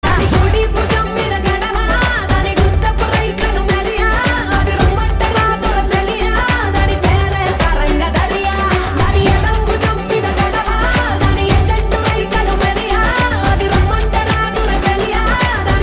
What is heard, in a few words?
Song Ringtone